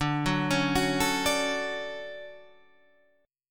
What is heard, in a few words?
D Major 7th, chord